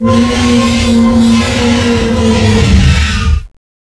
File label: roar; gearbox; renesaur